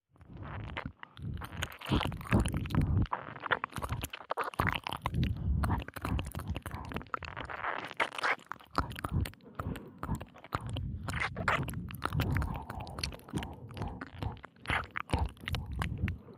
両耳舐め＋カリカリ囁き責め; 汎用性抜群のオナサポ素材

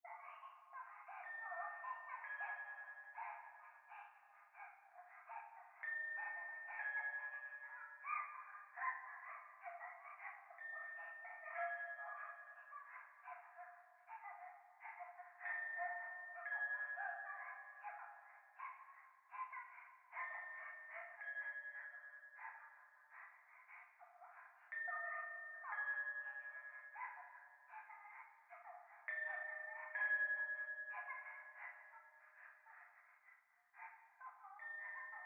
教会 可愛い喘ぎ 神社

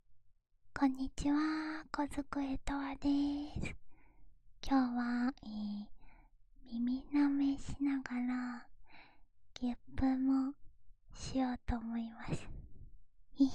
ゲップ ロリっ娘が耳舐めしながらゲップチャレンジ

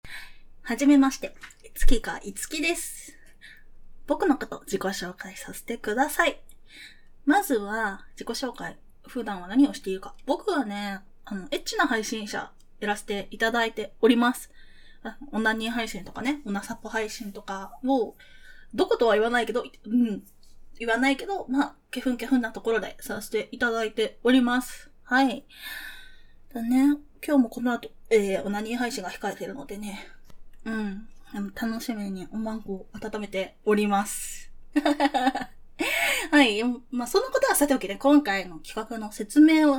ガチ排便

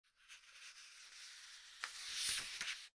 本をめくる

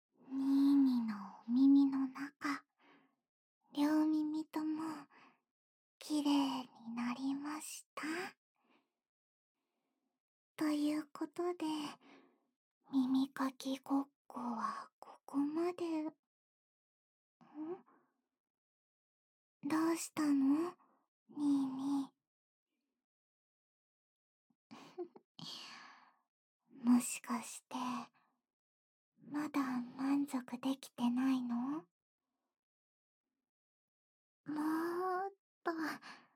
指で耳かき 反転, ASMR

